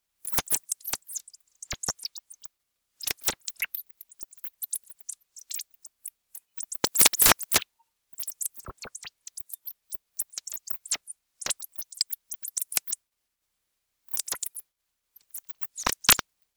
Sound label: vocalisations